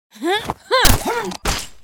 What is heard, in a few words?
Trample Succses; Goddess of Trampling